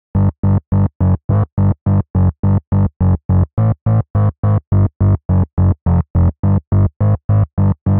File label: bass sub bgm